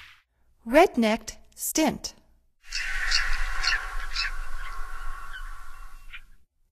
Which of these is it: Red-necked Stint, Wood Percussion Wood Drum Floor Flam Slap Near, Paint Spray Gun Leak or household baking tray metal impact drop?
Red-necked Stint